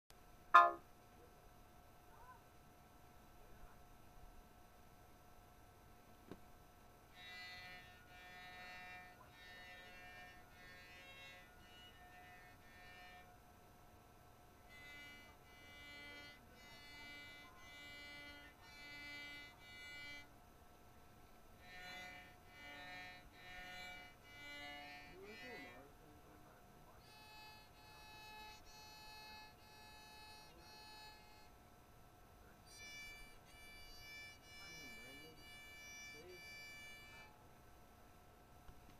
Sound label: violin